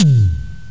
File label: synth drum, percussive; gmplay